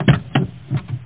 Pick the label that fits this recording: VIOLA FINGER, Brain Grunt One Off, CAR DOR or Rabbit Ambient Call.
CAR DOR